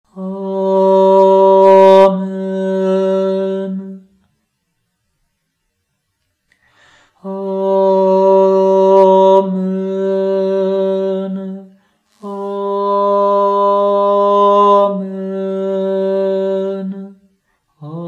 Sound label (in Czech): Amen amen amen